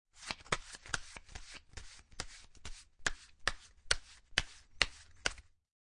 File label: Cards Playing Cards Shuffling; Playing cards, poker, shuffle. Shuffling cards; Card Sound Effects